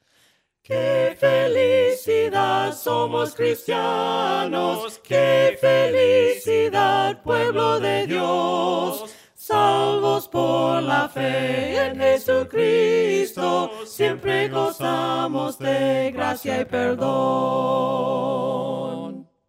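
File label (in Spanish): QUE FELICIDAD VOCES, himnos a capella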